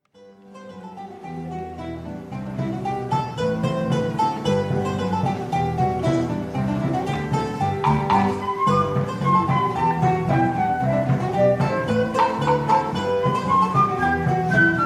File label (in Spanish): Polka Saludable